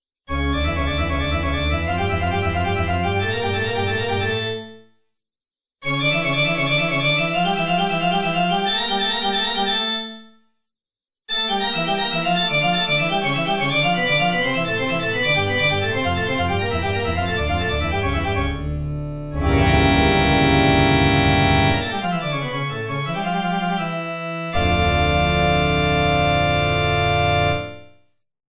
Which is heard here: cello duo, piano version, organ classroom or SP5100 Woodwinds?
organ classroom